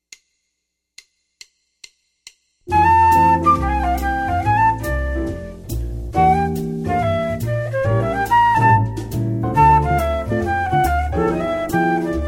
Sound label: bend solo